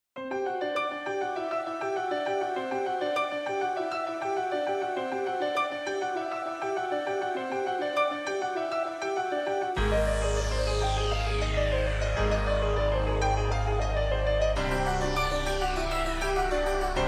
Piano Tone

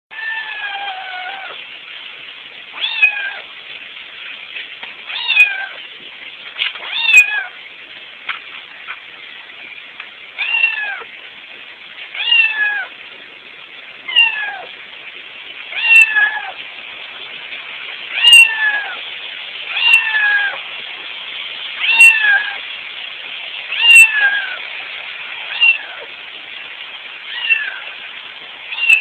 cat meowing nonstop; animals

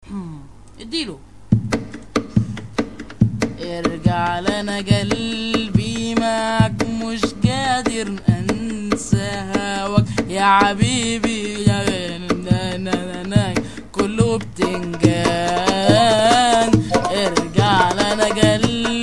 very funny ringtone

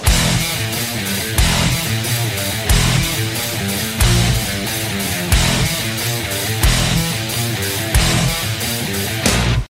Riff

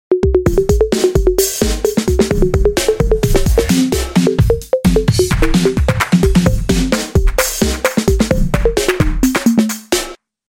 White Bells

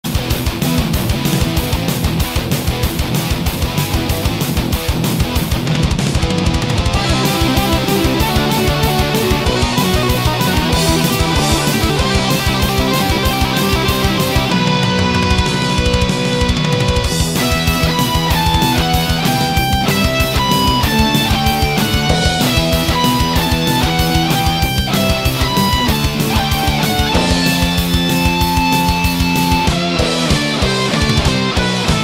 metal cover; power rangers

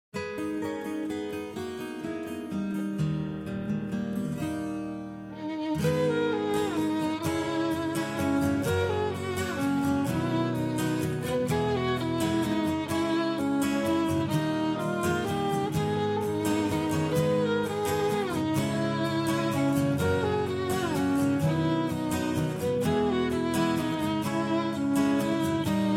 Guitar v violin

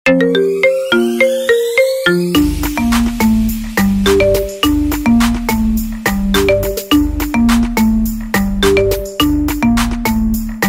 marimba remix

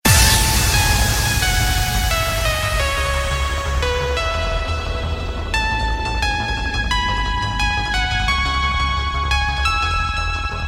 Transition

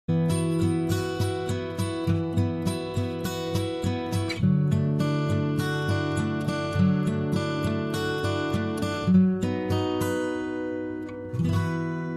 romentic piano

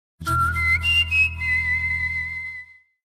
WHISTLE

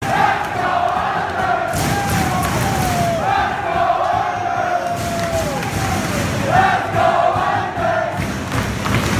Isles Chant; Barclays

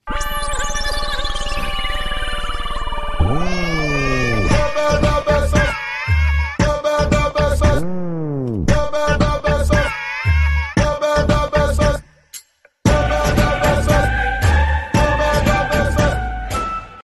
Background

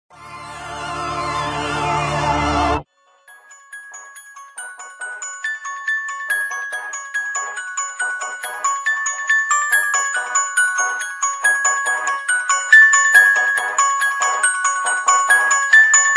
instrumental beat

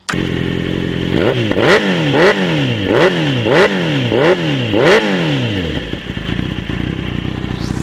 Kawasaki z750

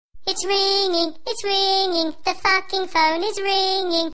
phone is ringing; ringtones

